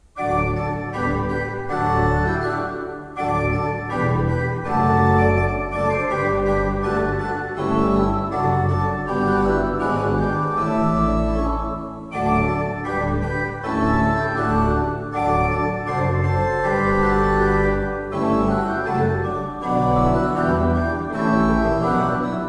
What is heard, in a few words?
Ott, pipeorgans